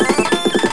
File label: Explosion; Revolution X